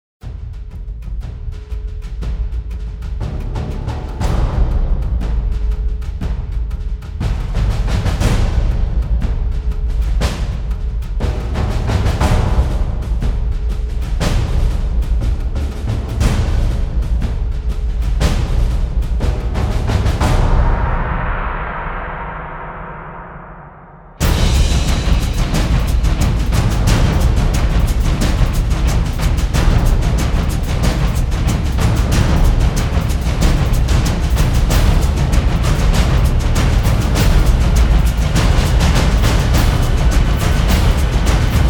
Drums of War, Percussion; Relentless Percussion; Gothic Storm Music

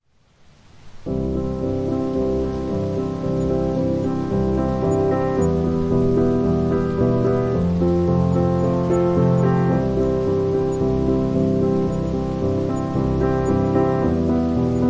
Classical Study (With Rain)

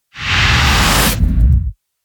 Shaker Wipe Wipe; Ninja Tracks

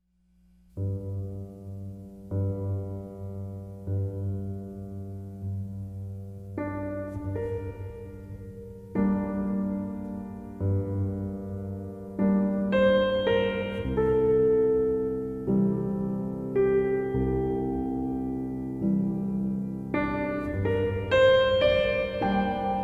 Piano Solo

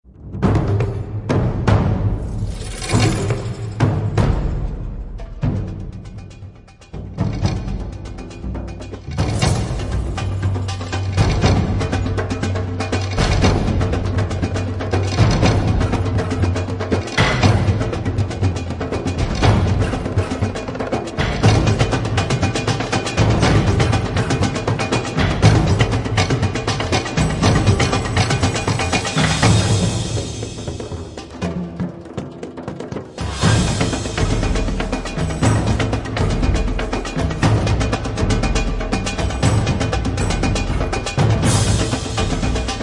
Drums Only Version

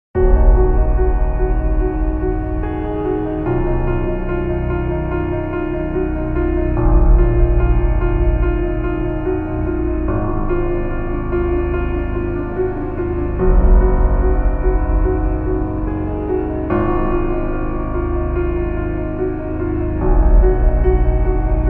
No Vocals

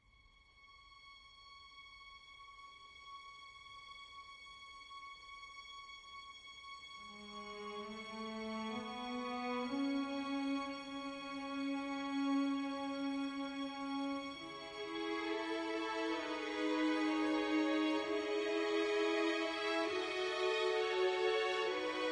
Letters (Orchestral); Fired Earth Music

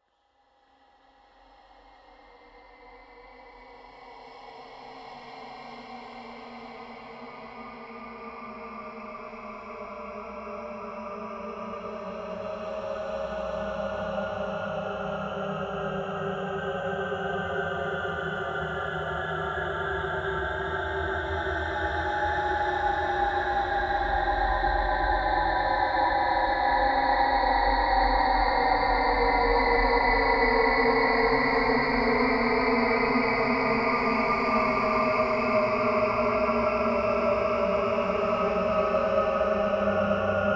Drones Vertigo; Gothic Storm Music; Advanced Sound Design 2012

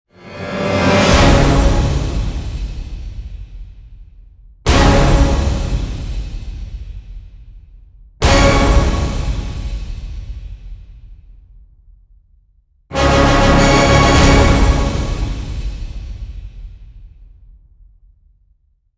Orchestral End Hits (C#); Epic Tools vol. 1

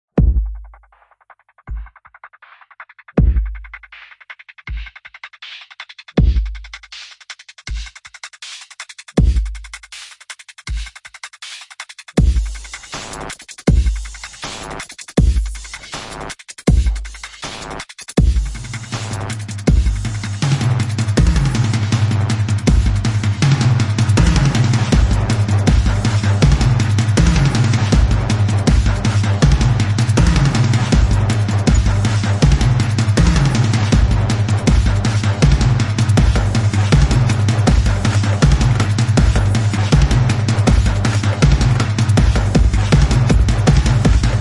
Predatory Swarm (Percussion); Fired Earth Music

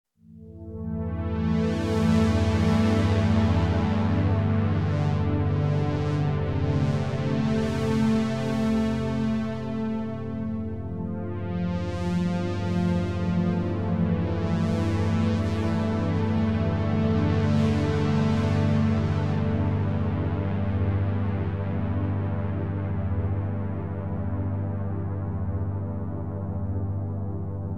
Emergence, Relaxing Rhythms

